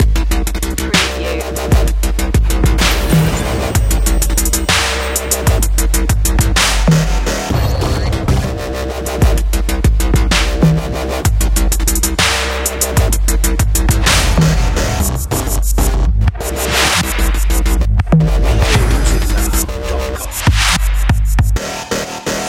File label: dubstep industries loop